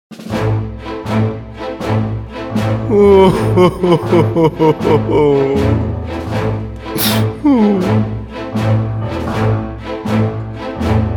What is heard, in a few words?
Sad Version